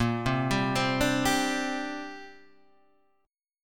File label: C 7th Suspended 2nd A sharp